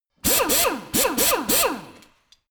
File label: impactor salve metal rattle; maschinen; bohrer